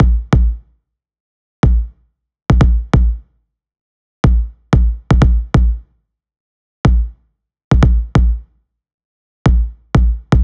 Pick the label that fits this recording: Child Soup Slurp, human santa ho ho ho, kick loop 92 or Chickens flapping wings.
kick loop 92